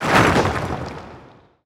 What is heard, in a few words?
stone remnant destroy; weapons; dota; earth spirit